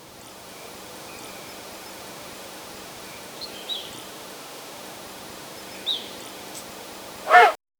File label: pandas calm voice